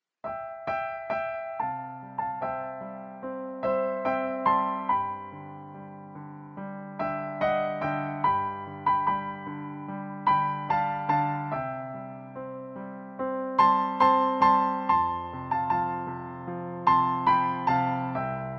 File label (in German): Playback C-Dur